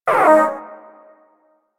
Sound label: Wyrm Idle, Vocals; Warframe; Pets